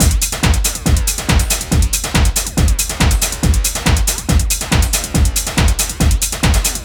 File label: DRM, LOOPS 140, House